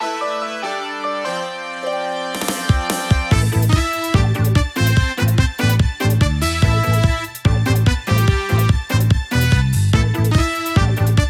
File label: JGL FAILURE LP